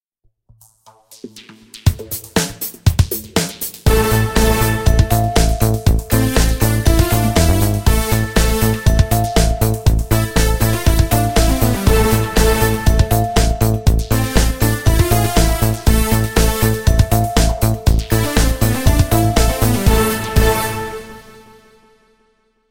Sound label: Hit Beat, ringtones; Hisense E602M